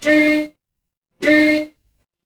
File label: ui countdown